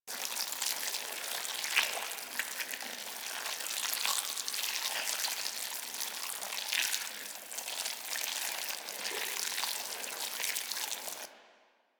gore loop fleshy fast; Ambience; Props; Warframe; Infested Eris

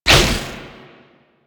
Necramech Melee Thrust; Enemies; Warframe

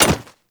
Hyena Micro Step Default, Footsteps; Warframe

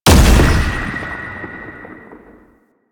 Unyielding Gallop Hit; Warframe; Dagath

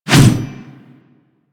Mace Whoosh; Warframe

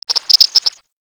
Garden Animal

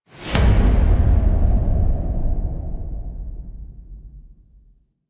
Amphitheatre End Show Stinger; Ambience; Duviri; Warframe

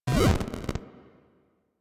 Corrupted Notes G; Warframe; Bard Quest; Bard Boss Fight

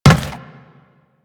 Run Metal, footsteps; Warframe; Teshin

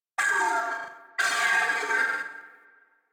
Sentient Death By Sword Vocals; The New War; Lua Valley Ext

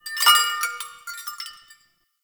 Witch Station Chime Jingle; Ambience; Soul Plane

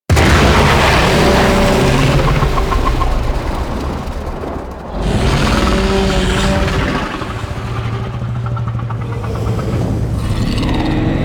Infested Micro Planet Sun Monster Hit; Warframe

